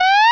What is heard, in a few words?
crash